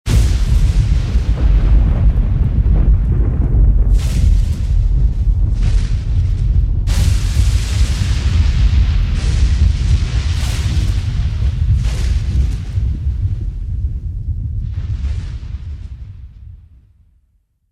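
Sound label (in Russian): гром с множественными всполохами молний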